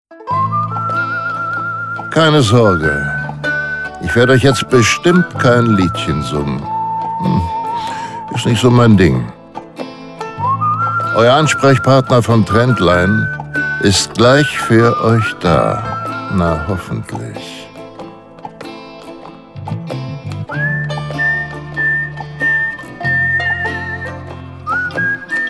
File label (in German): Warteschleife